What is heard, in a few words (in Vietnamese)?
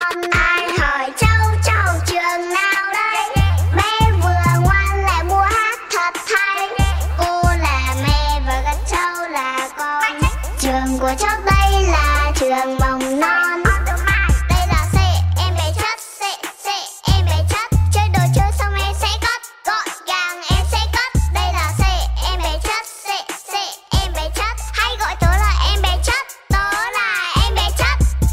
xe xe em be chat